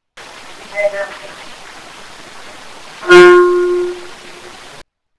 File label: Girls Room, keyboard; Case 007 Webb Home